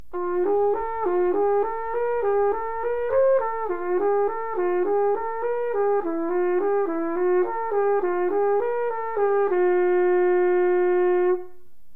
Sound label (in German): neue trompete; clarke